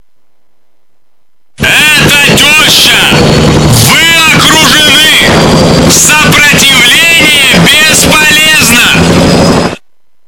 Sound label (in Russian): prikolnyj zvonok; ehto teshha